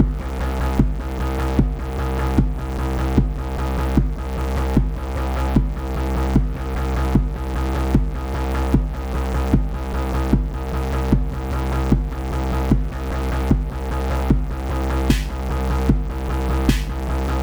Synthwave; Synthwave is Magic (Brony Magic)

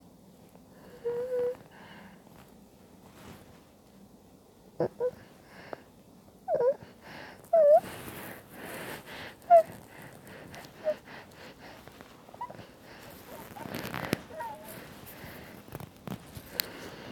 Mmhmm

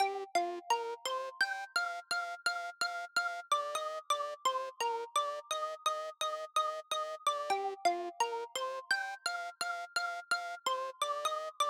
high octave part lead, 128BPM

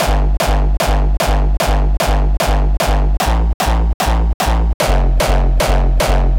Hard Kicks; 150BPM

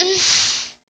Moan

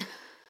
Breath; Lewd SFX

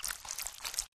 slosh; Lewd SFX

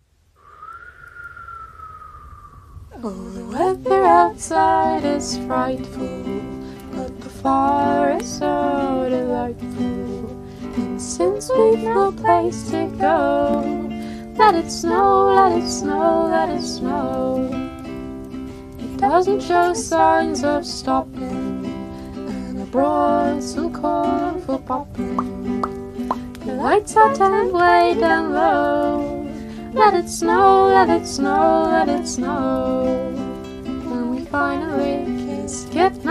Singing

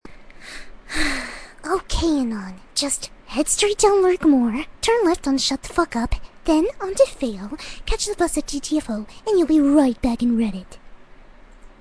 Sigh; Fluttershy

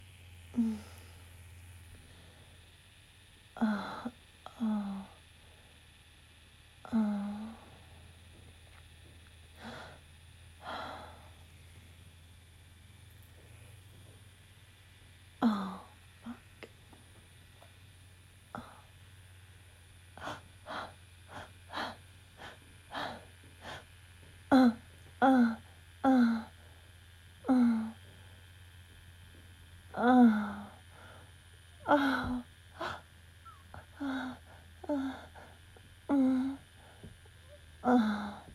wet noises and whimpers

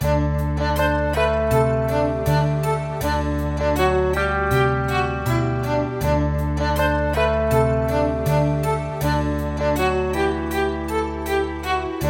game over loop

